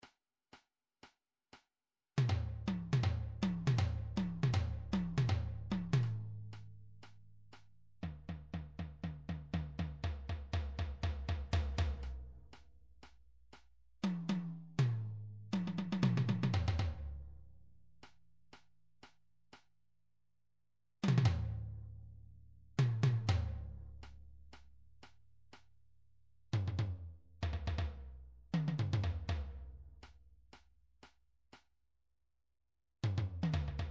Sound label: drum trax tom